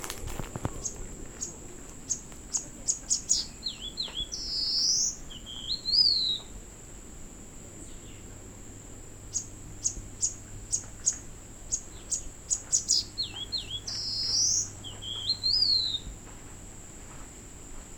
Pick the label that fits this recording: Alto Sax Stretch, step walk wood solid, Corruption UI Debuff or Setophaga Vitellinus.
Setophaga Vitellinus